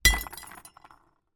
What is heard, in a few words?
impacts wine glass smash in cardboard box